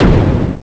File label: Trapdoor; Trap door completely opened or closed